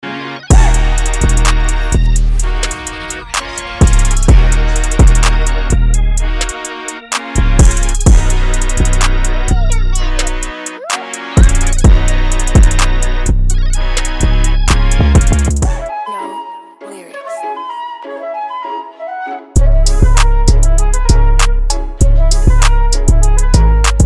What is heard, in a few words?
MAINSTREAM TRAP